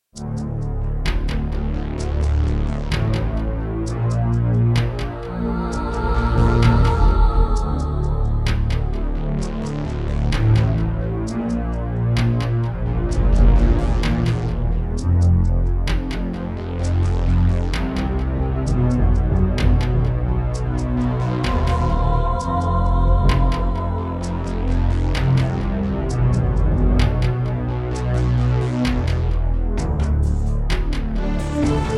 Yamaha QY70; Descent II

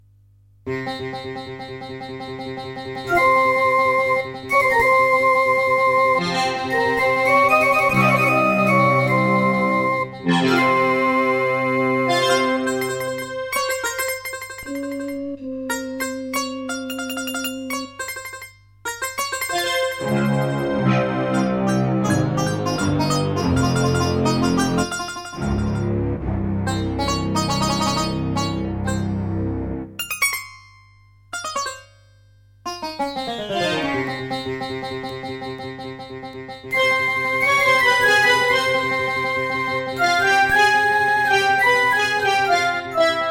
Aztech Sound Galaxy Wave Power; Prince of Persia 2 - Intro

